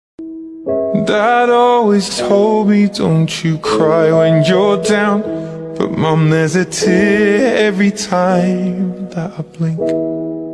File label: lofi english ringtone